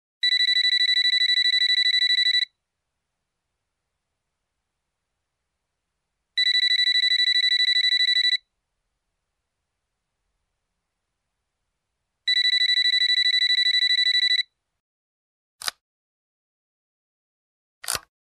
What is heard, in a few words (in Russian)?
telefon besprovodnoj zvonok